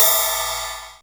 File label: DRUMTRAKS, classic machines